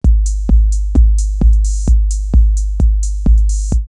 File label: 808 Deep Intro 130, drumloops, DrumMachines; X-Static Goldmine